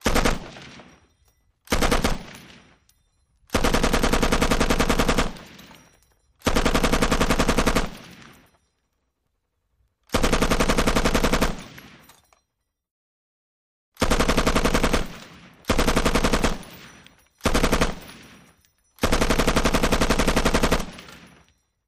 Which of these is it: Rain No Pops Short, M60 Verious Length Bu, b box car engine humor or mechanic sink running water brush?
M60 Verious Length Bu